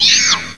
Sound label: bat disturb; Ferazel's Wand Demo